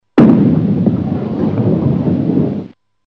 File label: Bowling Ball Roll